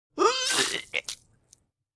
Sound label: Person vomiting on cement